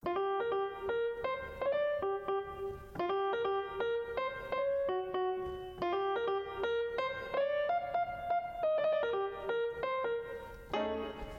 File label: jazz comp; compressor